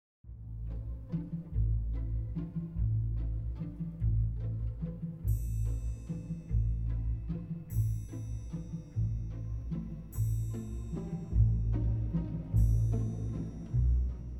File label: piano; level 2